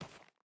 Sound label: footstep hide dirt walk; Rust